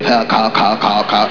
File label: mouth noises; "Mystery Sound Bite" Contest